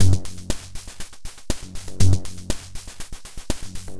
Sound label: Electronica Drumloop; Drums & Percussion